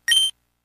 Sounds Effects

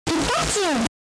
Pikachu SFX; RBY

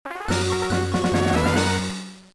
Win

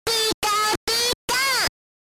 Pikachu SFX; RBY

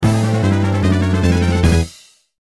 Fanfare First Capture Complete; Ranger

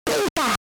Pikachu SFX; RBY

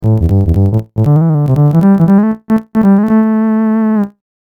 Trumpet; Go-Rock Squad; Ranger SOA

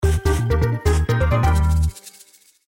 Jingle Menu; Picross

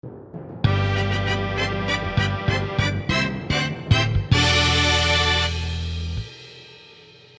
Jingle Clear Another; Picross

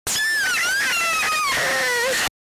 Pikachu SFX; RBY

